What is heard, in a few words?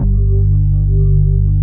B3 HAM, Keyboards Old School